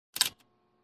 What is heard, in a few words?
Machine Switch Click